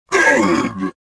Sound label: pain75